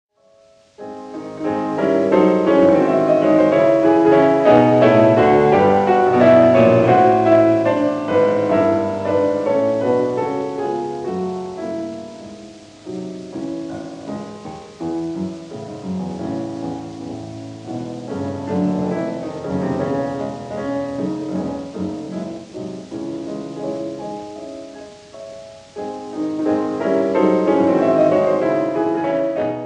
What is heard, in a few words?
Symphonic Etudes for piano, Variation, Un poco piu; Schumann; Op. 13; Un poco piu vivo